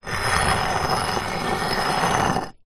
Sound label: rock or stone movement drag on concrete ground; foley